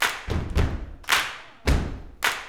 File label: CLAP FEET